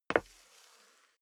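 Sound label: step dress boots wood parquet slide long, footsteps; schritte; menschen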